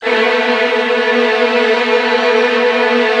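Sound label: Virtuoso Strings, violin trills, A1; ILIO; Kirk Hunter Virtuoso Strings VOL-1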